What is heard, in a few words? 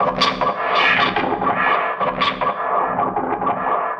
NRG SFX, Trance; Sonic Foundry (Sony Creative Software); Trance NRG VOL-1